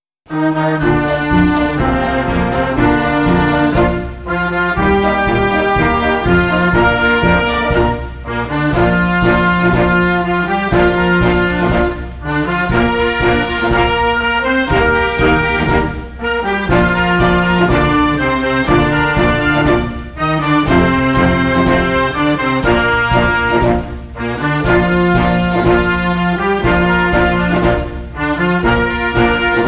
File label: Brass Band